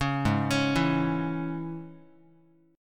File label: G sharp Suspended 4th Sharp 5th G sharp, Guitar; G#sus4#5; arpeggiated; JGuitar